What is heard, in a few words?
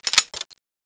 clip in; tfa cso